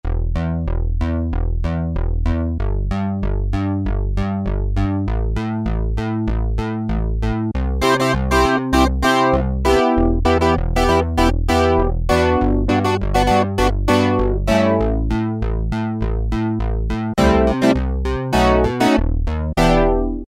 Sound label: Clav II, Roland Juno-60; Factory presets; Factory presets group 2